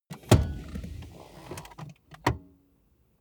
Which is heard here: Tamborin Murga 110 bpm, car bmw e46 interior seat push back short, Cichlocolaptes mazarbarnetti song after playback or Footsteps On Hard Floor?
car bmw e46 interior seat push back short